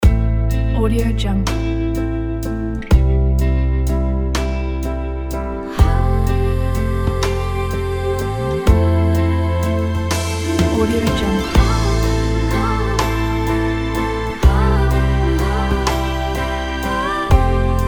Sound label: glamorous feminine luxury